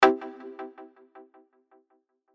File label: C maj triad